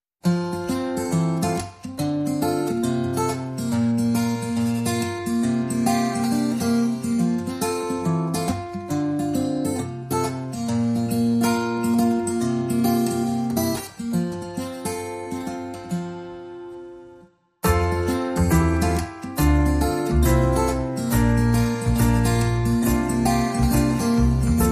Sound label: No Lead Guitar Mix